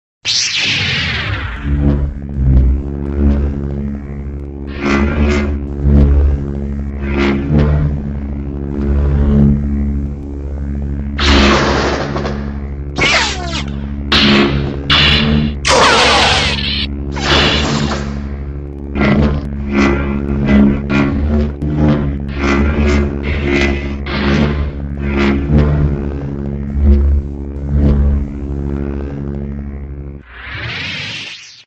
lightsaber; reversevape

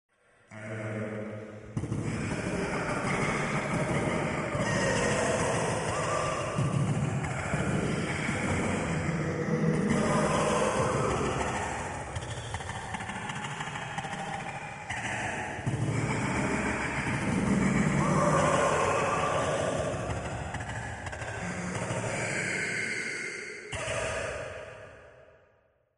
pool; cave

